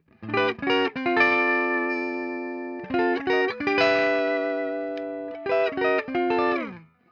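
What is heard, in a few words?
jazzmaster clean; fender classic player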